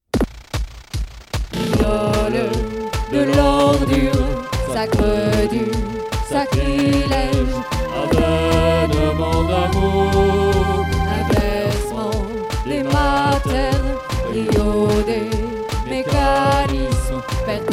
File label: techno